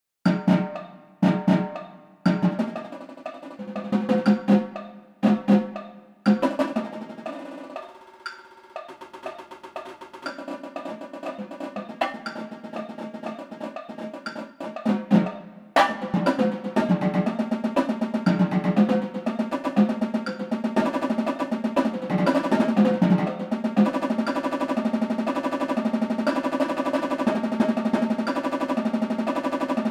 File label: Tenors